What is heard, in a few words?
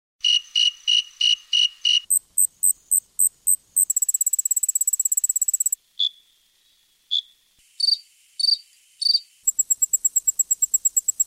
Sound of crickets